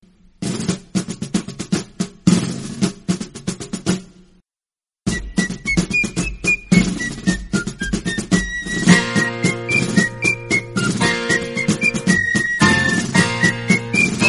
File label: yankee doodle sound